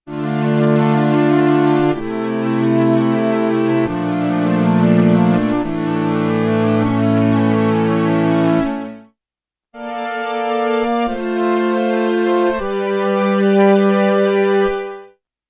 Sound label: Korg Delta Strings ensemble original